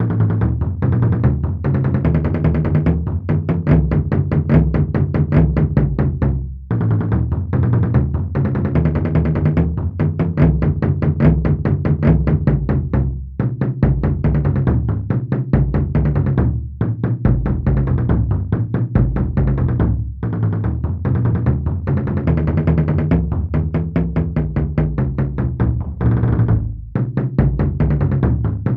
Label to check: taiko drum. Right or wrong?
Right